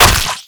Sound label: chainsaw gore; garrysmod